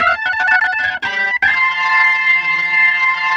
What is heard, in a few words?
Organ Lick; Ambient Grooves; Organs Licks